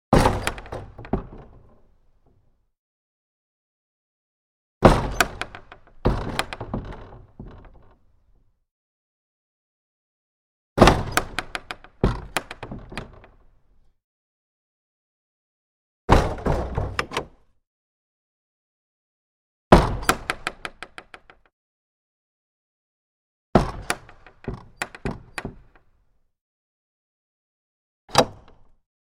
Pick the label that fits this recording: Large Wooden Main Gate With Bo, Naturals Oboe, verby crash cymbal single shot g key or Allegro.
Large Wooden Main Gate With Bo